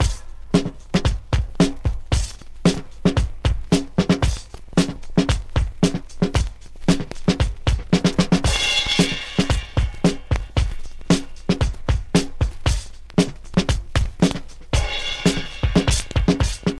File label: 114 bpm modern drum groove f key, kick tuned to the F note